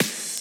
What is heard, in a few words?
fill